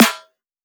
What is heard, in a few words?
Instrument Layer, snare; M8